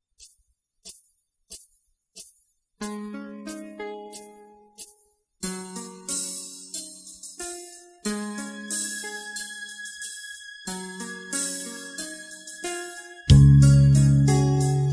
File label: Instrumental